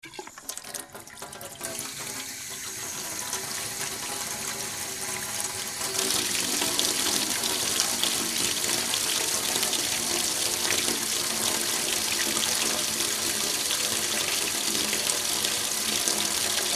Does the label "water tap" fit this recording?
Yes